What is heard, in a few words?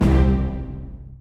Combo Win Hit; Duel